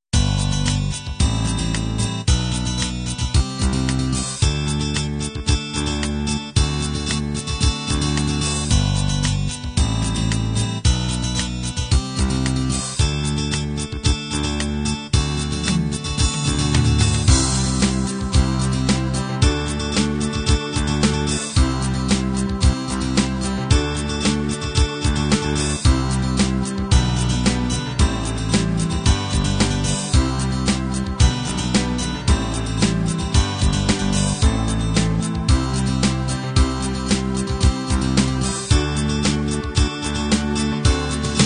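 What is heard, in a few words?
pop 16 beat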